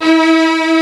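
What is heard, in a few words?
KURZ A4, strings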